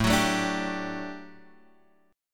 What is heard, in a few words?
D-Major Add 11th A down Guitar Standard; strummed; JGuitar